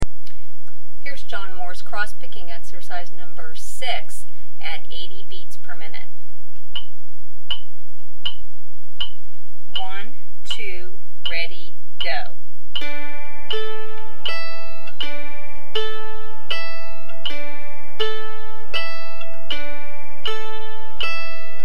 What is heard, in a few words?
Crosspicking 80bpm, mandolin